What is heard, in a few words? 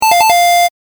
correct; 正解の音・ピンポーン